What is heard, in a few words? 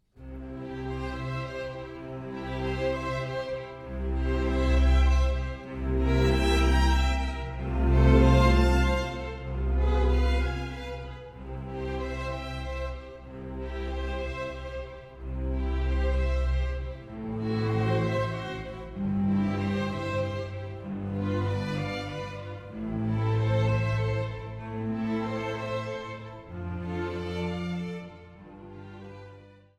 piano